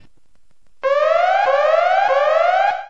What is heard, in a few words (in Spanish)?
alerta evac